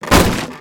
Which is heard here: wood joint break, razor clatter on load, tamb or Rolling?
wood joint break